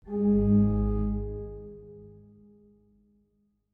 organy G#1

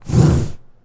przestawianie kosza

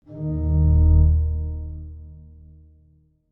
organy D#1

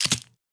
wsadzanie czaszek na kamertony

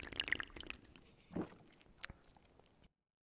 picie z butelki

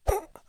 scinanie reki

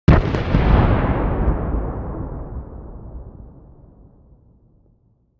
wybuch

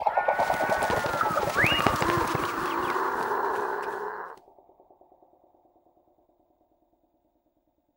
Ptak przelatuje na druga strone; apocalipsis

